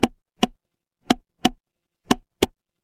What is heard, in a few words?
instrument wood block